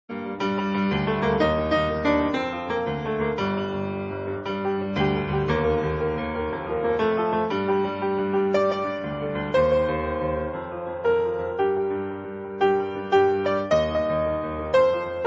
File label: grand piano; Druid II; Fairlight